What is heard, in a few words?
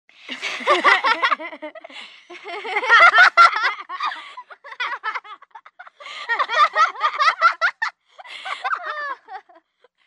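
three children laughter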